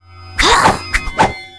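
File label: Mob attack; 백발마녀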